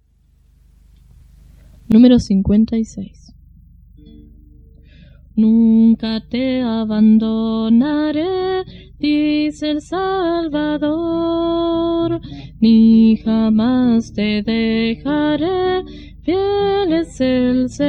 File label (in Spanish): Coro, himnario niños